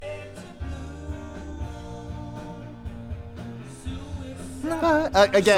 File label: VOX Back